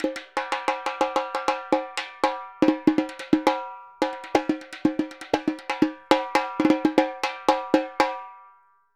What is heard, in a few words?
Repique Samba 120 bpm; Best Service; Festa Latina VOL-1